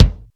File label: beater k, Jazz; SN-R8-05 Jazz